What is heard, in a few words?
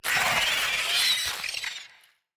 Roar; Kekastle